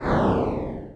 boss atk

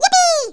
yippee; sfx; Universo Animado

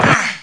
OUCH3

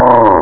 aaaargh; parasite

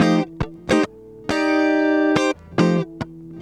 GUITAR F; VST

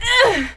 grunt, female fighting; KINGPIN

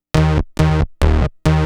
bass; Techno Music Maker

